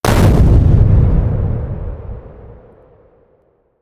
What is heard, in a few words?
large explosion; factorio; Factorio 0.14.21; fight